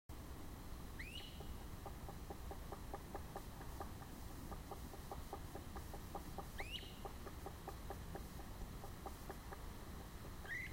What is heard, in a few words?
Red-bellied Woodpecker, birds; Indian Springs; The Birds of Indian Springs; audio cassette tape